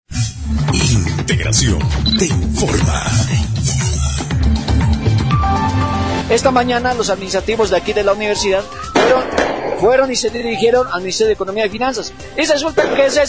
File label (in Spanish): Marcha